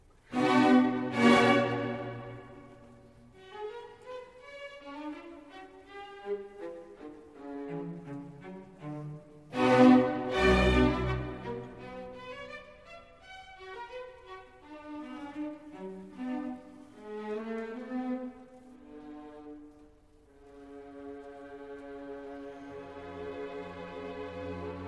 String Quartet in C-Sharp Minor, Version for String Orchestra; String Quartet No. 14 in C-Sharp Minor, Op. 131